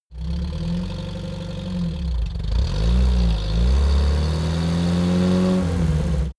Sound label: car uaz 469 idle start accelerate